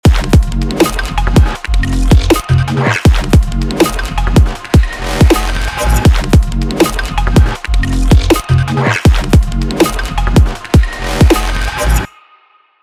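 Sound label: reverb work snare off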